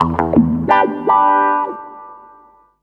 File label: Wah Wah